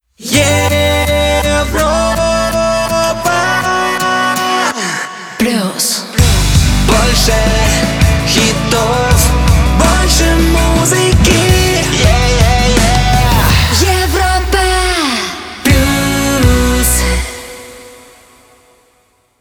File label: Jingle; Europa Plus 2022